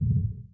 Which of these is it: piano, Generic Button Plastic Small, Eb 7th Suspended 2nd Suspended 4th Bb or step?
step